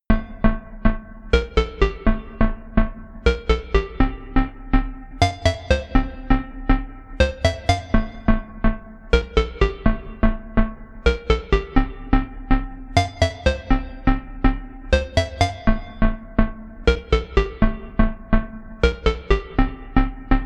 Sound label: 80s Game